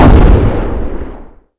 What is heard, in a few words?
357 shot